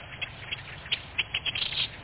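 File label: swamp, ambient; Quake; PainKeep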